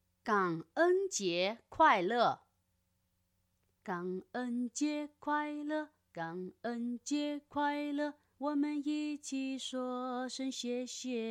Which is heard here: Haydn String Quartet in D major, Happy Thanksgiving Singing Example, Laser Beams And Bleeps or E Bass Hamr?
Happy Thanksgiving Singing Example